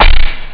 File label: bdrum; jambala; Amiga Soundtracker Sample Packs